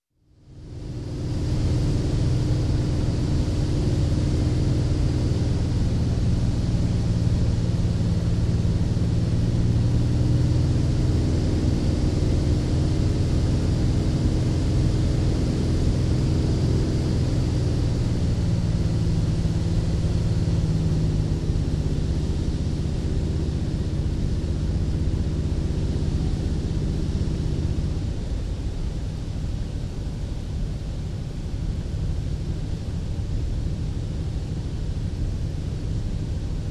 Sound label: Propeller Airplane soft touchdown in cockpit